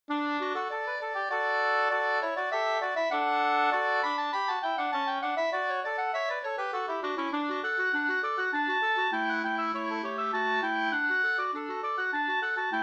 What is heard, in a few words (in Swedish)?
slängpolska